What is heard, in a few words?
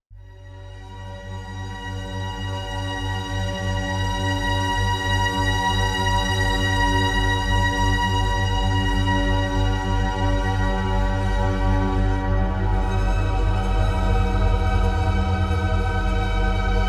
Upstream (Ambient); Dungeons & Dragons